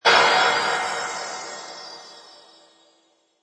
Magical Burst Twinkle Mysterious, Magic sound of casting spell; conjuring or wizardry; Witchcraft ritual summoning; Burst Sound Effects